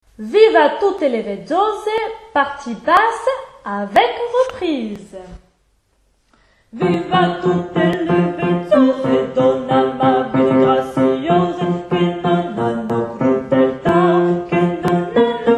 Tenor; Viva Tutte; Giardini

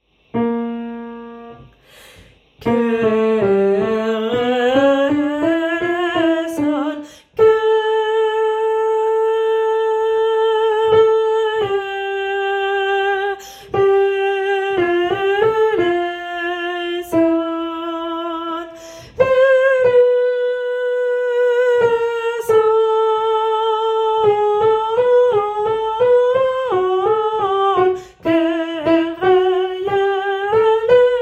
alto; Kyrie

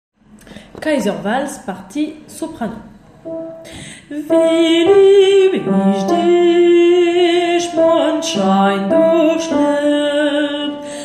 Walz soprano; Kaizer; Strauss

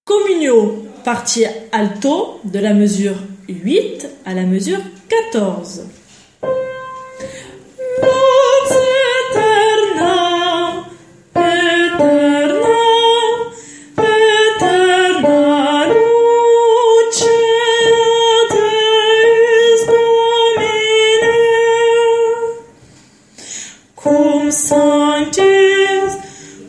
Alto; Requiem; Lux Aeterna; Mozart

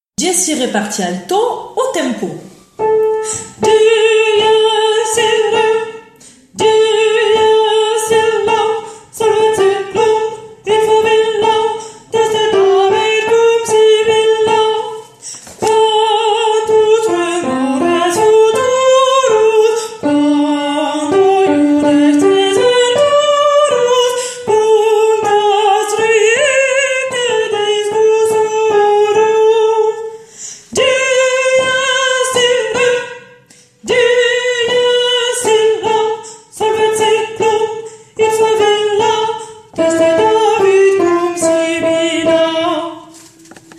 Requiem Dies Irae Alto; Requiem 31; Mozart

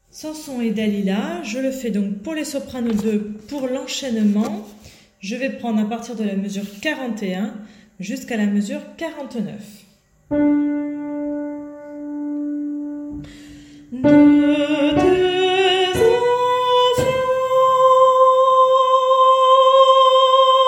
Soprano 2; samson et dalila

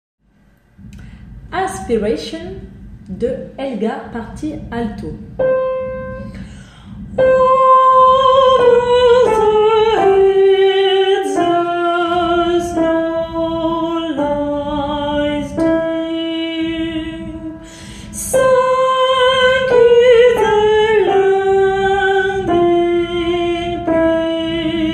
Alto; Aspiration

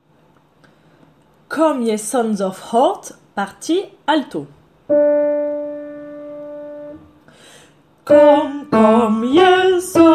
Come ye sons of art alto

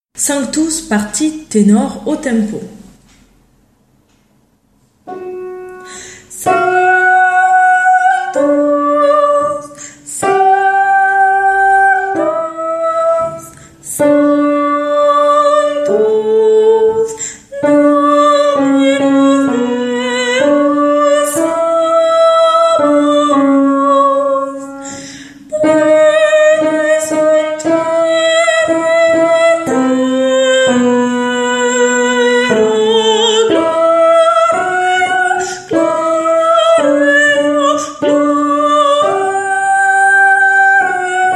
Tenor; Requiem; Sanctus; Mozart

